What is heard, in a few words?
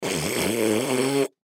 Snort Element Male 20 Year Old; Human; Blastwave